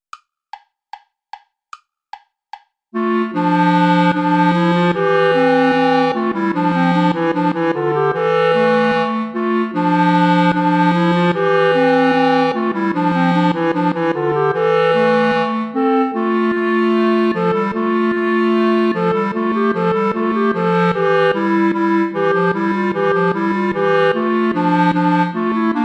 bourree play along without bass clarinet; praetorius michael

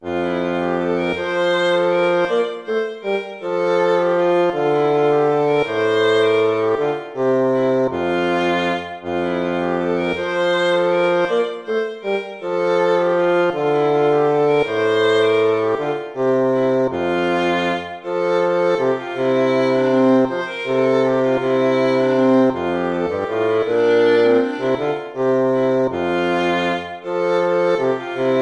lute

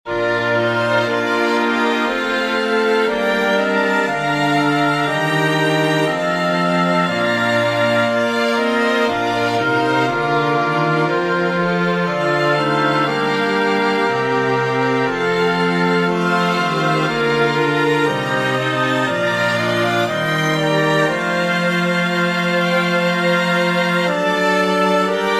chorale for woodwind trio strings; bach johann sebastian; sei nun seele deine

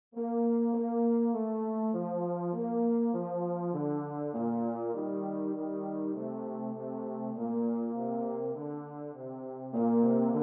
euphonium

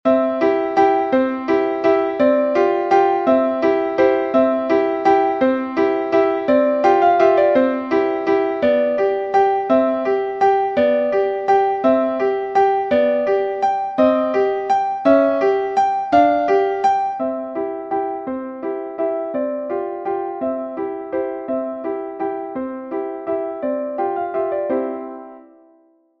little dance; petite danse; kleiner Tanz

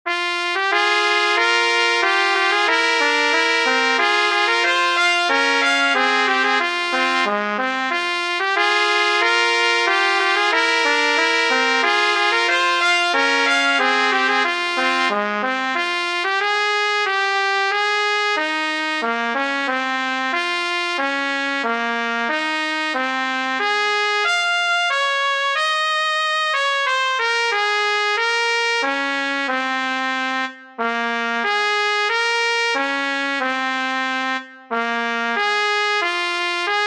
polonaise trumpet; bach johann sebastian

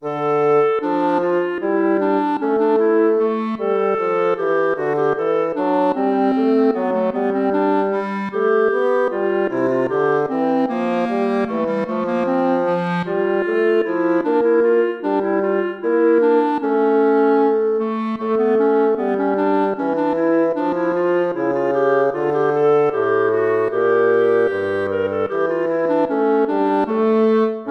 air, hornpipe

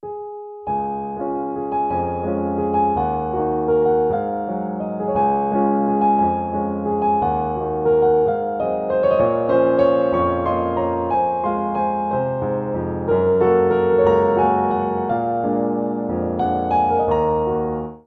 for piano hands

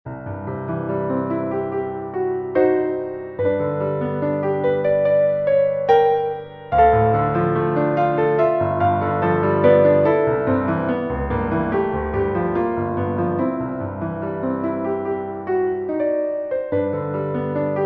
piano; Lyric Pieces

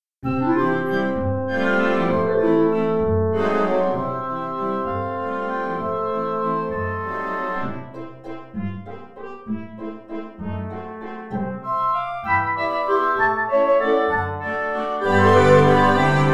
waltz; warwick aaron; autumn

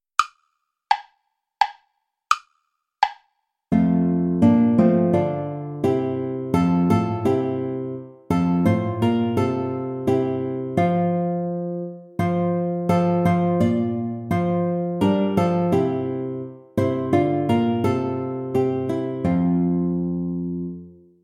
play along without guitar; lord sing with lips and heart

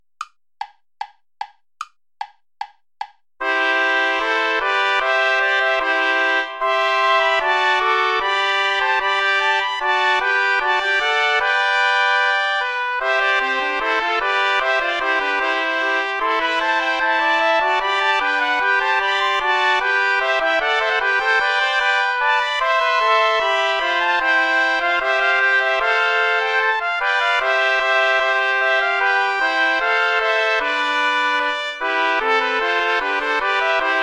ballet play along without trumpet; praetorius michael iii

